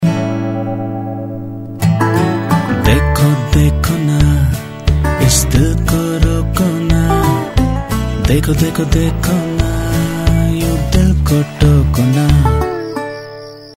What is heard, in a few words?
TV ad song; Maruti Suzuki DZire